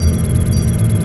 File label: beep fast; ambience